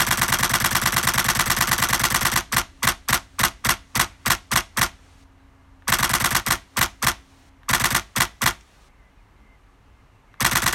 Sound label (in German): D850 Mit Grip